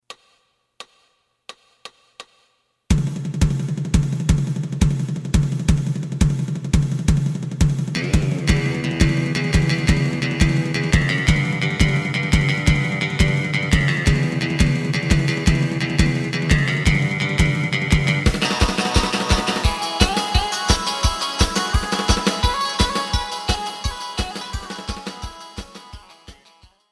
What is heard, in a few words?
bass